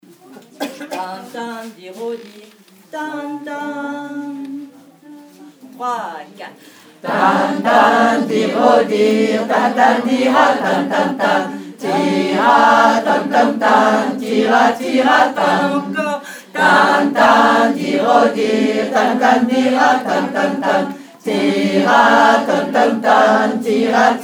refrain 2 voix; Kanarkann

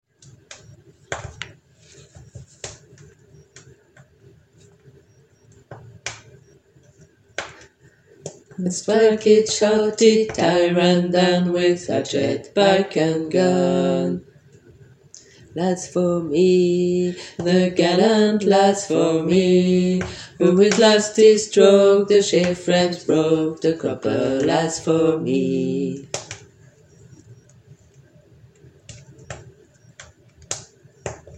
voix 2 basse; Chanson écrite par John Walker en 1812. Les « cropper lads » sont des artisans de la laine; The cropper lads

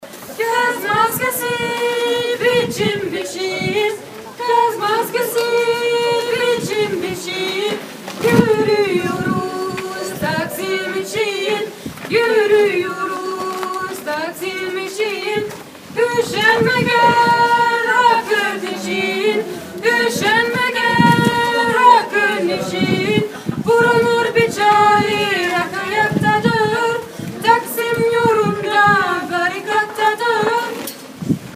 Capulcu aigu couplet; voix haute 2ème couplet

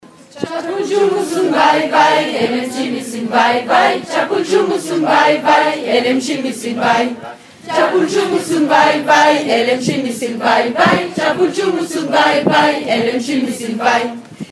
lead refrain; voix principale refrain (se chante à l'unisson au début)

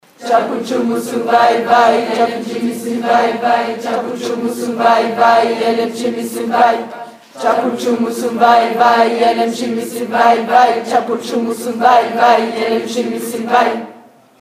basse refrain; voix basse 2ème refrain

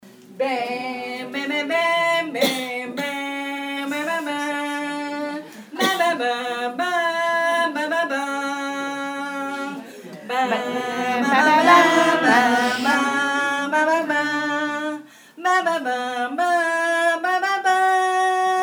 Kanarkann bombarde

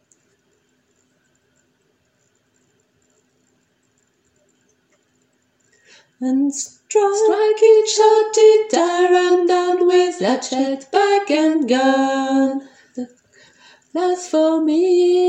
voix aigue; Chanson écrite par John Walker en 1812. Les « cropper lads » sont des artisans de la laine; The cropper lads

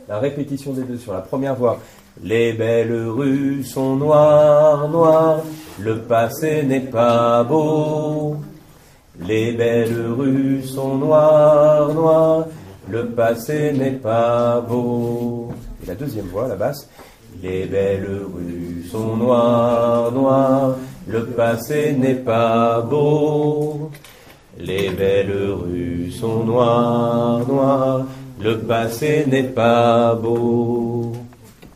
couplet1 difference lead basse; Esclaves

